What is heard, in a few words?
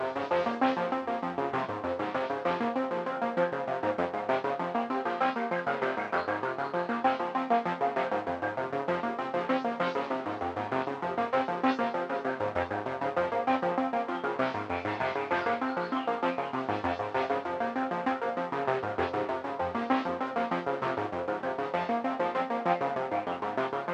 Scifi Loop; Get Even